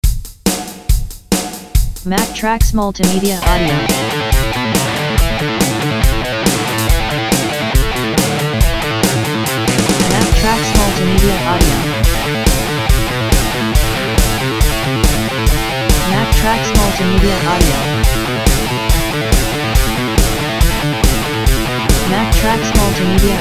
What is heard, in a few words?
guit riff speech